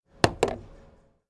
pool table ball drops in pocket

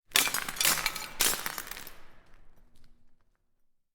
glass crunch

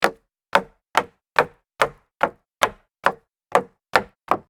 jog up solid wood stairs

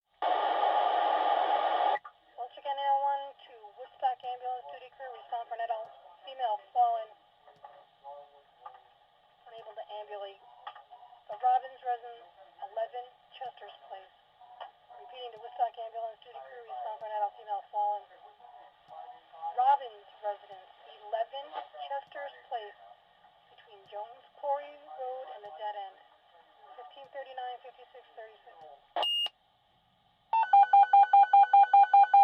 fire department dispatch pager

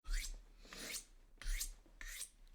knife sharpen

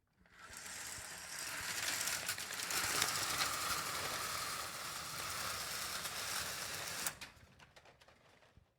slotted blinds opening

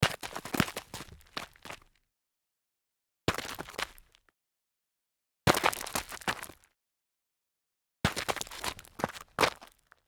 sneaker stumble side rough grit

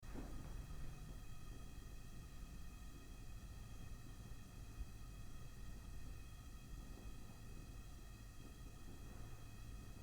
gas lamps, household

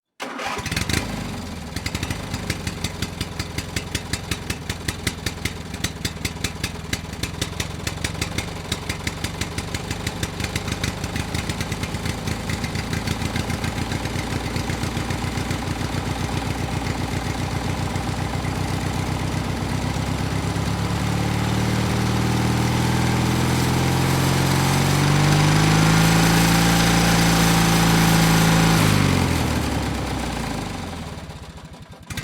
two stroke revving

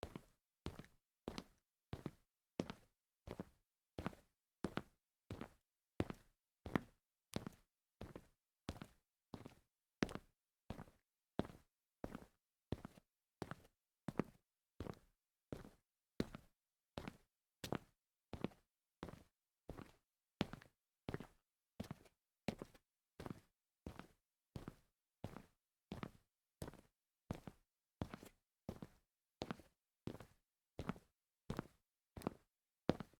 walk on tile series, foley

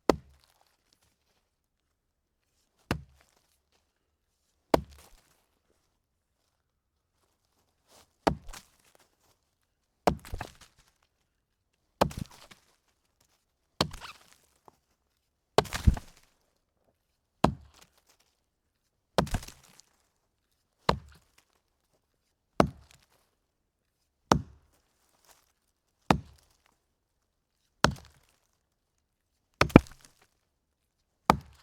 chopping log

